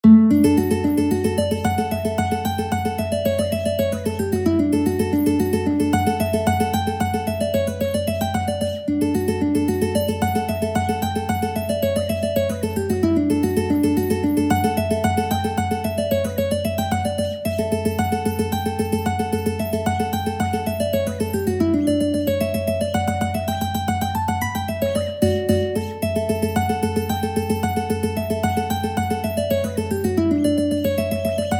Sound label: Hornpipe, mandolin